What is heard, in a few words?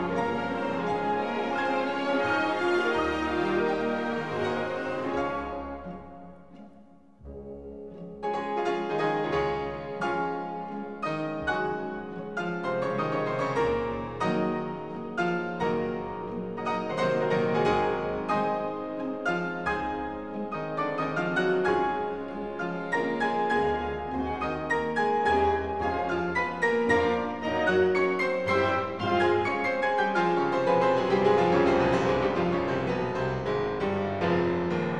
Piano Concerto No 1 In B Flat, Symphony Orchestra